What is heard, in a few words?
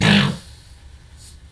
fart